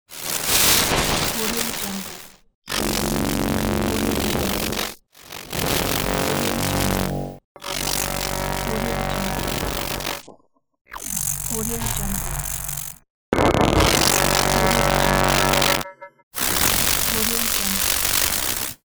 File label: glitch electric spark